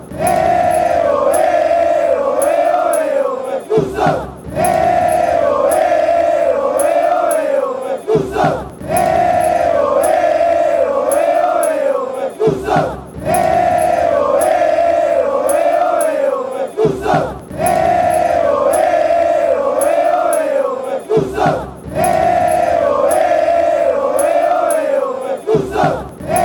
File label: eh oh eh, fan chants; tuzos